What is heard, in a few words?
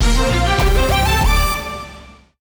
win; Fire Queen